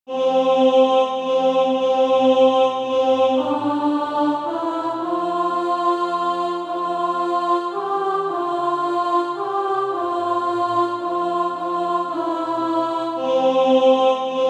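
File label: alto, Sheet music (SATB) including Practise Music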